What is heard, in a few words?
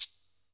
Shaker Hit; Jungle; Pecussion Hits; Zero-G Jungle Warfare 1, 2 & 3; 90 s Sample CDs